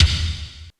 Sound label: CYBER KIT, DRUMS